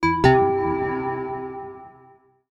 mobile game melodic stinger wavy kettles incorrect